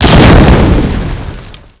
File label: explo grenade; Spearhead Demo; MacFormat 2003 June